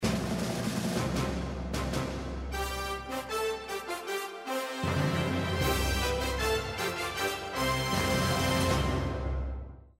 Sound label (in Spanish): open musica sola; cineplex